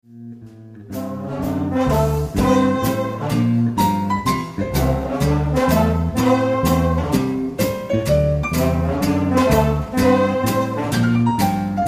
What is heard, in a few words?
Straight Sound, Guitar